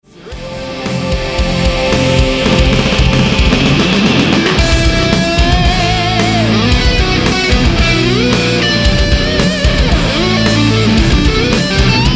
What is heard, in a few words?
Silent murder (Bulldozer - Modern High Gain)